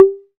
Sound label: sharp tom sample g key; tom drum samples